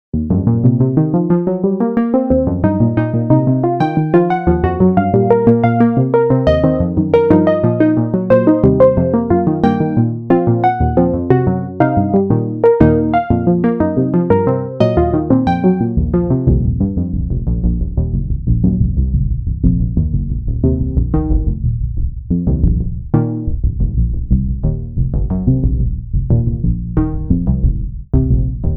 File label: Massive Swinging EPs; AC Spiral